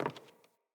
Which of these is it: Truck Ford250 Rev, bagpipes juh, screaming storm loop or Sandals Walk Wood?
Sandals Walk Wood